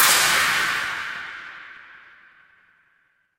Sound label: magic scifi laser